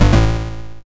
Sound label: YM Chord A; ChipShop Deluxe; ChipShop Hits